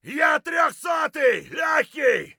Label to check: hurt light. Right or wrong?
Right